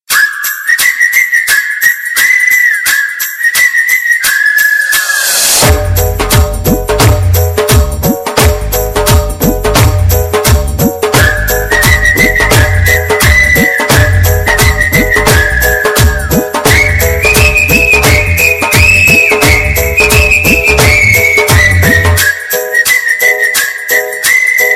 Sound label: whistle instrumental ringtone